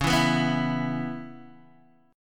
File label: Db Minor 7th Db Guitar Open D